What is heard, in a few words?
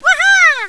wb attack, monster wombat; wizardwars